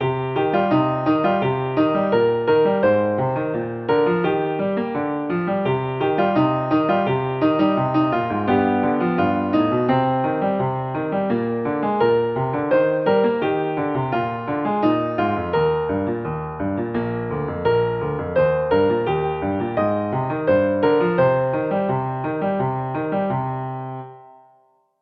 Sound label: Heaven Is Singing accomp; hymnary